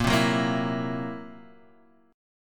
C 7th Flat 5th A sharp, chord

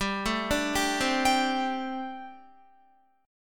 G Suspended 2nd Suspended 4th, Guitar; Gsus2sus4; arpeggiated; 15,12,12,12,12,14

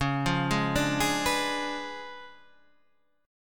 Db 7th Flat 9th, Guitar, Standard; Db7b9 arpeggiated; JGuitar

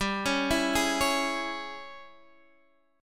G sharp Major 7th Suspended 4th Sharp 5th, G

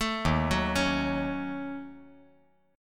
D sharp Minor 7th Flat 5th D sharp down; arpeggiated; JGuitar

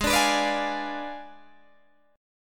Ab Diminished 7th Ab, Guitar, Open G; Abdim7; strummed

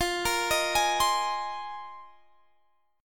C Minor 7th Sharp 5th, F, guitar chord; arpeggiated; Cm7#5/F

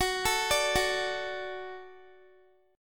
F sharp Minor Sharp 5th F sharp Guitar; F#m#5 arpeggiated

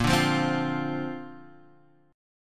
A sharp Major Add 9th, Guitar, Standard; strummed

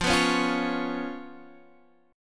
C sharp 7th F, chord

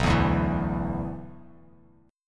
B-Minor Double Flat 5th B down, Bass Standard; Bmbb5 strummed; JGuitar

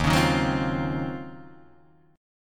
Eb Minor 13th Eb down Guitar Open D

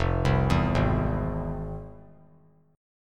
F Minor Major 7th; FmM7 arpeggiated; 3C,3F,3A,3D; 5,7,7,6

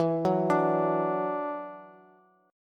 E 7th Suspended 2nd, down, Bass; E7sus2; arpeggiated

